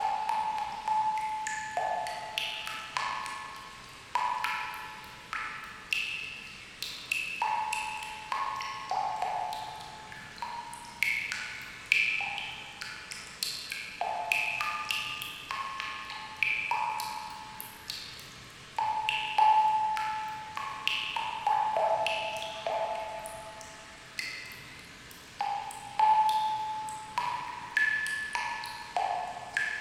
sewer

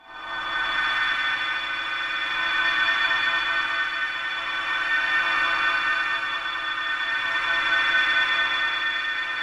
aura, battle

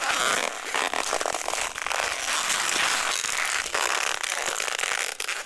constriction, battle

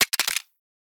handgun fall

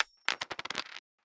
money drop

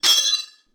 katana clash

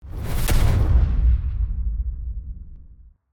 text impact

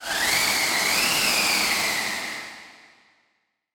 gust

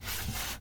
drawer open

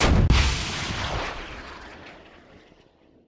rocket launcher

